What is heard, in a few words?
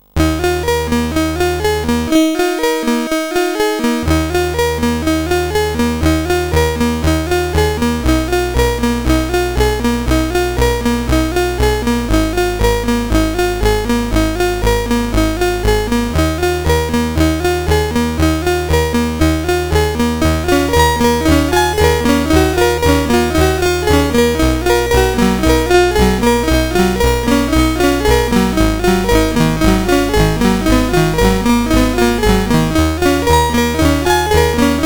BASIC, sid CSG8580R5; Arkadenoid